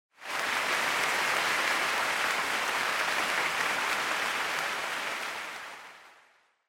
Applause, Far Mid

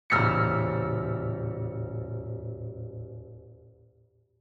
Piano Long Reverb

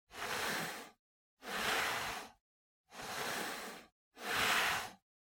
PC Mouse Move Hard Pad Long

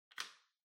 Light Switch American Toggle Hall Gentle

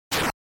Fighting Attack

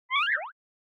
Anime Motion, Slow

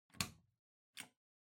Light Switch Wide Panel, Wall Gentle

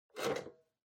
Kitchen Scale Weigh Remove

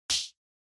Hand Synth Clap Dry

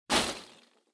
Loose Fill Packaging, Hand In Strong; 梱包材

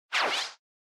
Motion Agility Long; モーション 俊敏

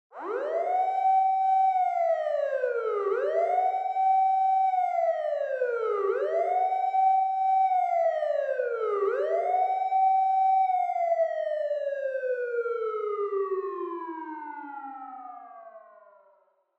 Police Car Siren Far

